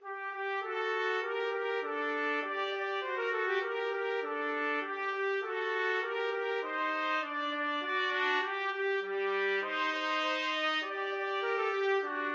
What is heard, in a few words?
Sivivon, trumpet duet